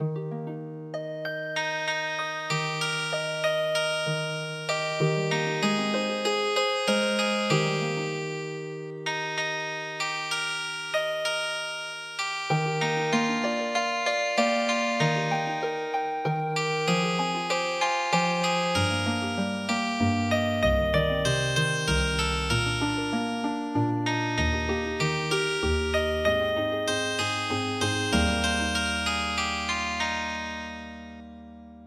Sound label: Healing Harp, midi